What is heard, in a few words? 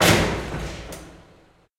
Pilon High Impact